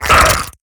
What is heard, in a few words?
Exertion Light; Evolve; Gorgon